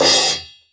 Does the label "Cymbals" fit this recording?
Yes